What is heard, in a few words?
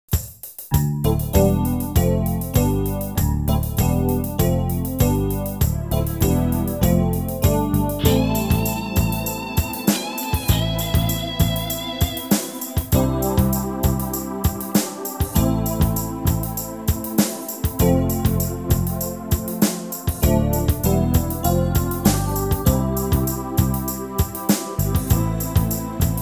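C live trak, Backing Tracks for Male Vocals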